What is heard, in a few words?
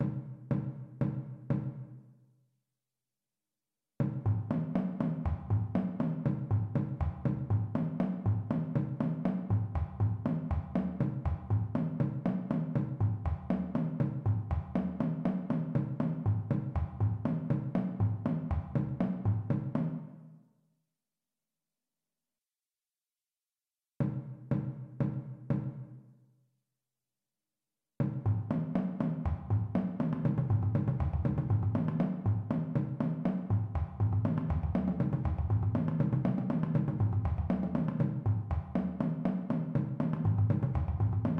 Eighths Bass; Bass Eighths Variations; Drumline Warmups